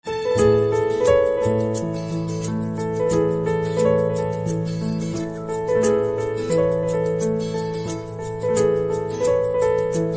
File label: Piano Instrumental Ringtone